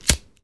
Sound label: lighter; buttons